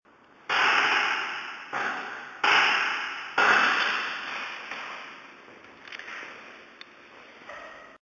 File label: creaking seat